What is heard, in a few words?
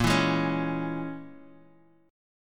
A sharp Minor 7th Flat 5th, Guitar, Open D